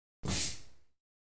Ping Pong Paddle

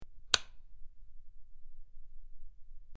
Switch Big Metal Ligh, switch clicks

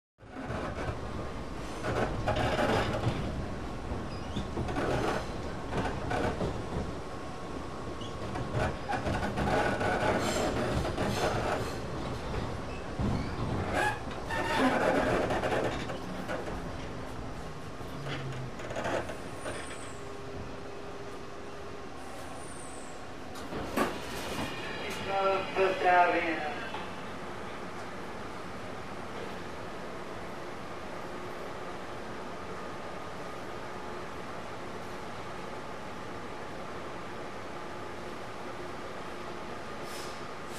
Interior Modern Subw, subway

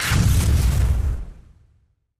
BIG EXPLOSION

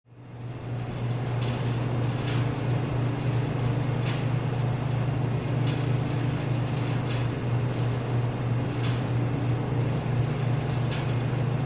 Heavy Machine Hum

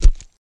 Corn Snap Low End Thum, gore stabs & eat

